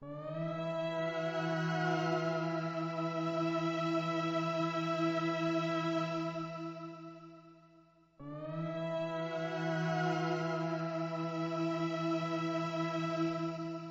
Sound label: synth